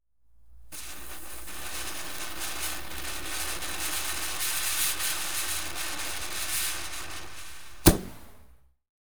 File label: Fireworks, Mine Of Serpents Fizz; BBC Sound Effects Library; Explosions Guns Alarms